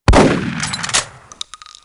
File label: shotgun